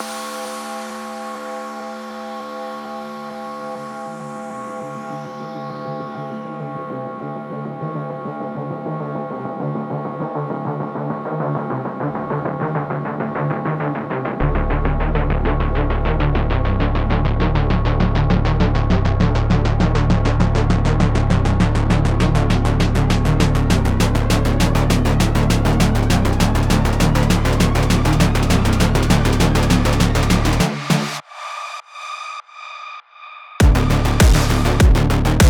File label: Electro Song